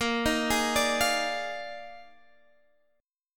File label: Eb Major 7th Suspended 2nd Suspended 4th Bb